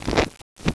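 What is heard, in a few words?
shuffle, cards; Seahaven Towers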